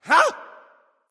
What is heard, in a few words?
grunt attack; smite; ahpuch